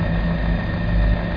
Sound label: ENG ROOM